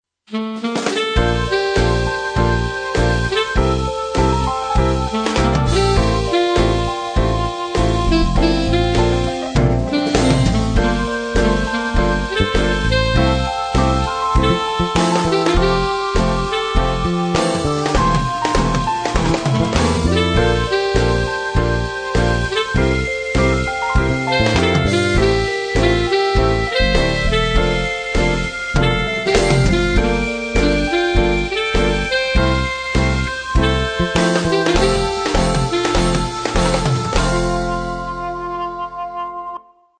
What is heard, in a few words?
Ring Easy; Asus P526